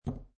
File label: Poker Chips One Chip Dropping On Felt; Gambling Sound Effects; Casinos